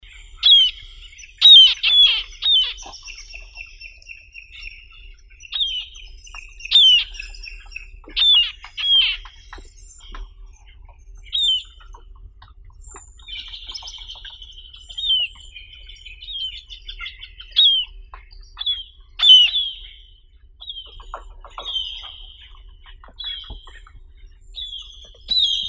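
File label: marbled murrelet, Brachyramphus marmoratus; pizhik dlinnokluvii; zvuki prirodi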